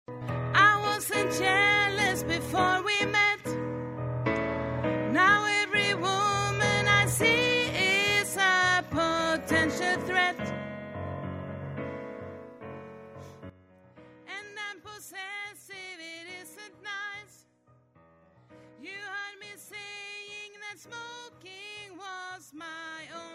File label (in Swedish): sopran